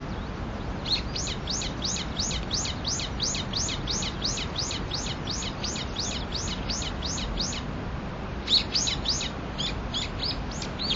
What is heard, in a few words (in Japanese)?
hiyodori